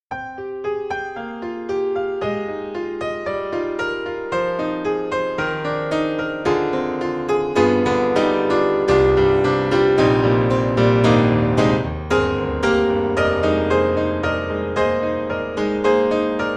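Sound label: Model D, piano; Steinberg; The Grand 3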